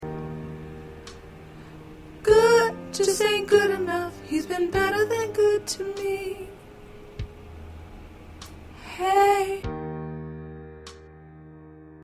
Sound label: Soprano